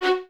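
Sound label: Violins F#4 staccato right; Miroslav Vitous; String Ensembles; 11 Violins; 11 VS Stacc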